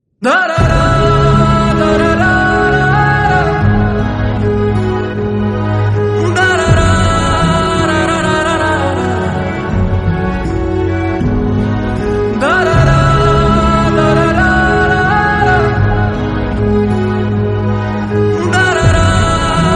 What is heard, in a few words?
Sad Bgm Ringtone; ringtones; Jalier